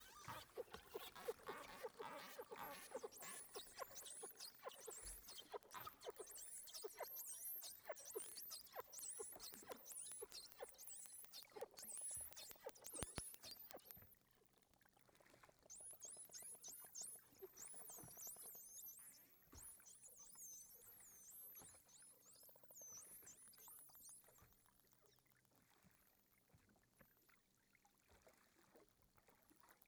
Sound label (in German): Tümm mit viel zwitschern und Grunzen